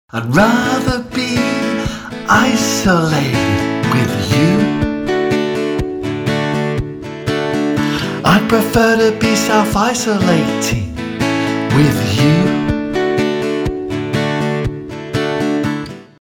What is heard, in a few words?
Ringtone